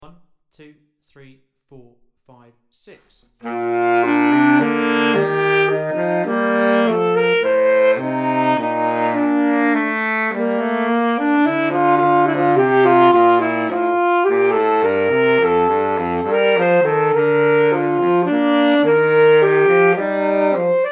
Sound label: Alto and Baritone; Study 8